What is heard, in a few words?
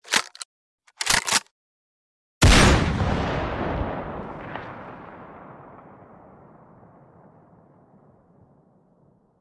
Sniper rifle shot with reload